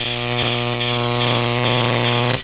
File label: alien buzz; prayingmantis